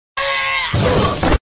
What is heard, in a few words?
fell; chatsounds